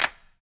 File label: Snares & Claps, One Shots